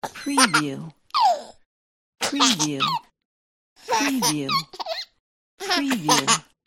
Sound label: little baby laughing